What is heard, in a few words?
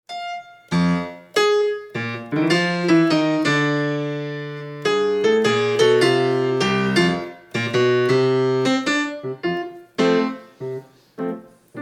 PIANO Ragtime blues